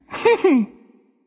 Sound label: hehheh; smite; voicepack; thanatos